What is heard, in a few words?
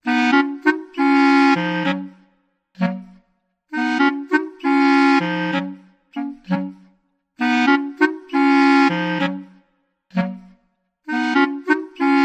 Solo Clarinet